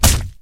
silnij udar

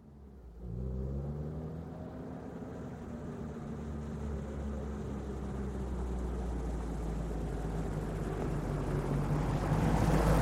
uaz medlenno podezzhaet i udalyaetsya

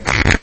brosok kolodi na stol

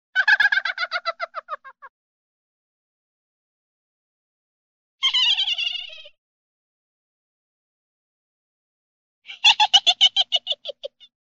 smeh malenkoj devochki korotkij smeshok

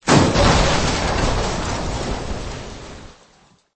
voda vsplesk aktivnii

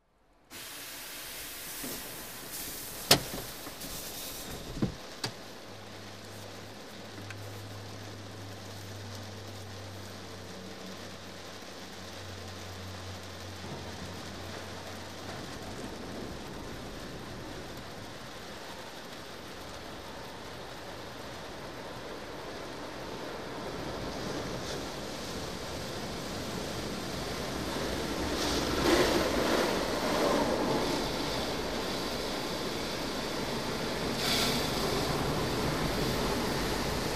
tipichnij zvuk avtomojki

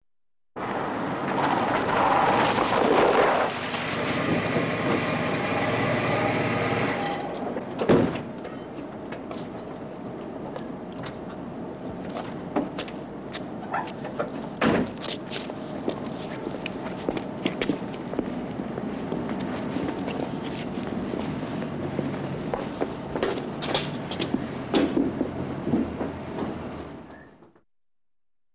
podezd avto s ostanovkoj i shagami voditelya

